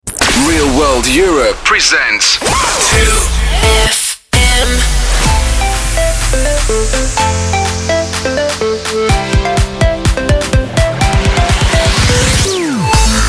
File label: Jingles; ReelWorld; RTE 2fm; Taster 2014